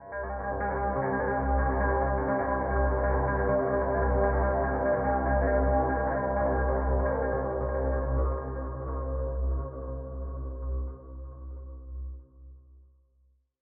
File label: Drone Fiths C